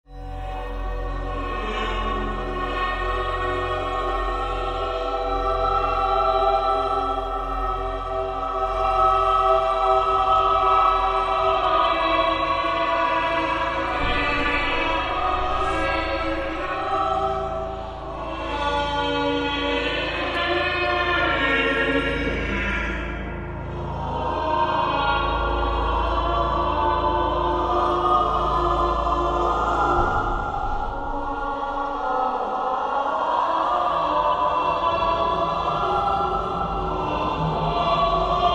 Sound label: creepy ghost choir singing horror sound effects